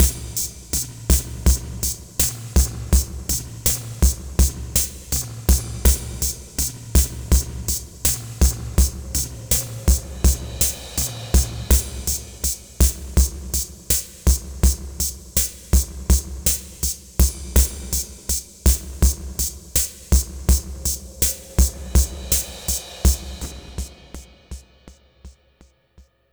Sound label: Sunrise Drumz Wet, Lounge; Zero-G Kocktail Kollection; Construction Kitz (Lounge); Sunrise Mixes 082